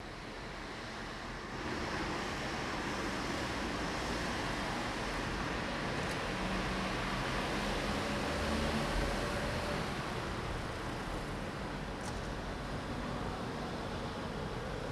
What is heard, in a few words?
City Traffic Ambience